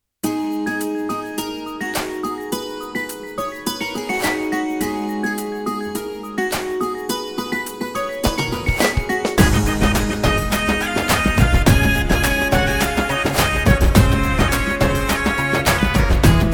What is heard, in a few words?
Ambience Song